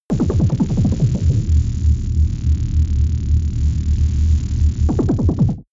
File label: space alien engineroom calm